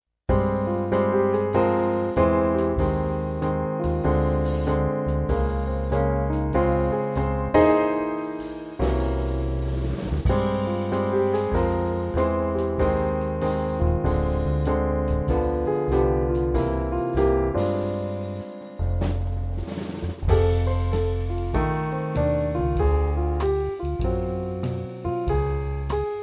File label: Minor mood, piano; Jazz